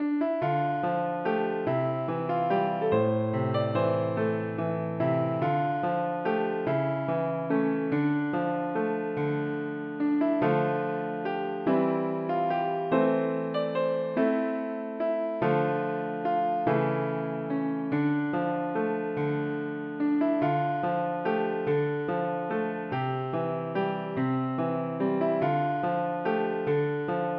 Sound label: piano